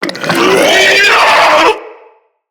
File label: Infested Ancient Attack; Enemies; Puss Ancient